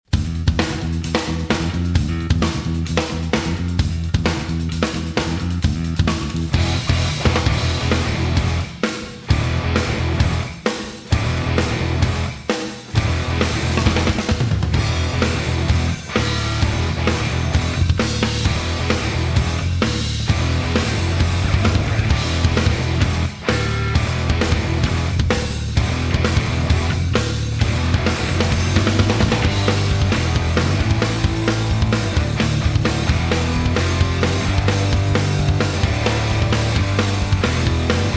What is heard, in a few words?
Bad Ass Instrumental; PM USA